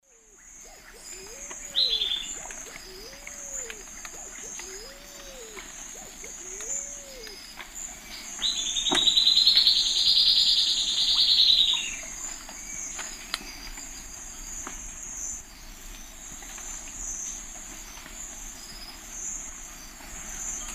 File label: Sclateria naevia, song; S. America; Eastern Lowlands